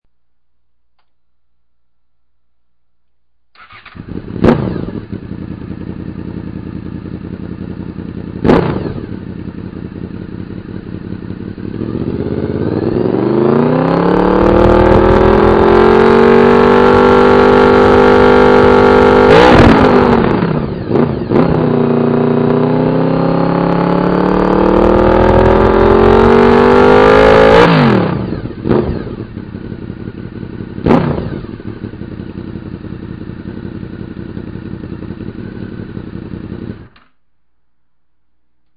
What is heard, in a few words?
Honda VFR800a